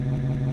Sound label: muscle car, engine; simpra